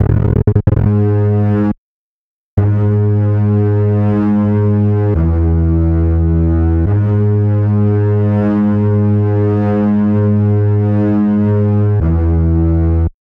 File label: bass